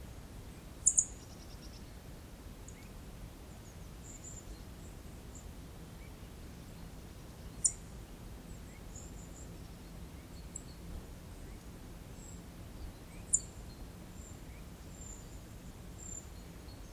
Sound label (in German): singdrossel